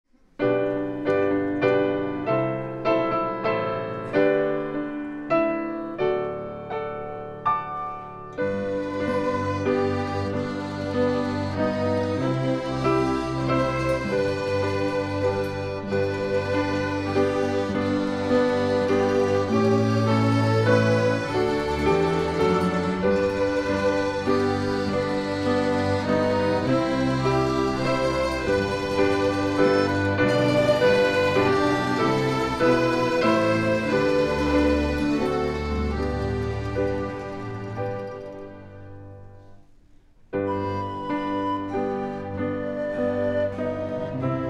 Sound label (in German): Vorspiel